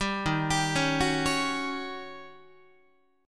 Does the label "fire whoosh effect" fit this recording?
No